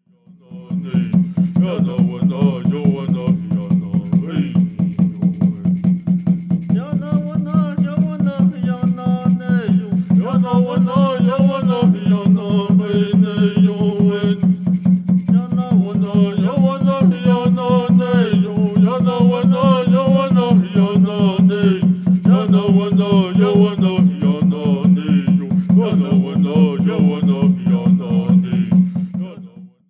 Peyote Song

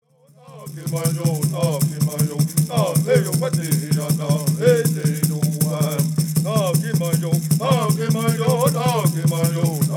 Peyote Song

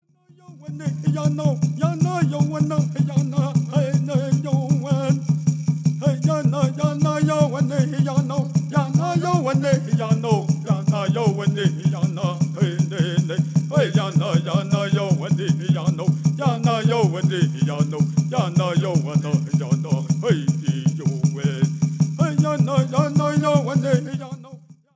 Straight Song

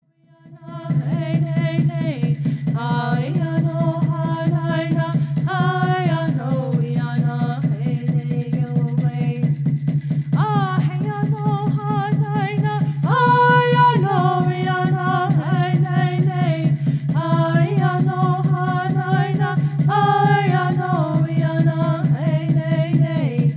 Straight Song

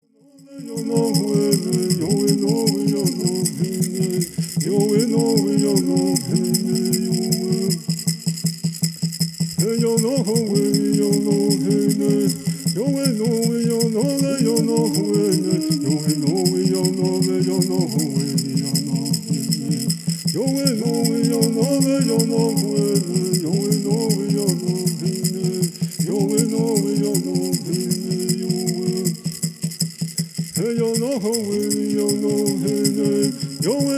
Peyote Song

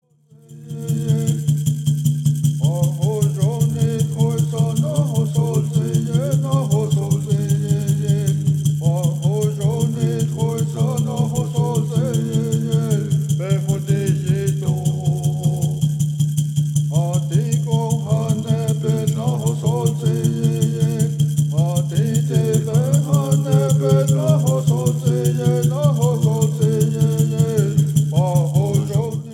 Native American Church Song

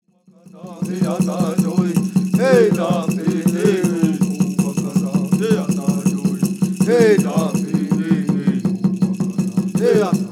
Ceremonial Song

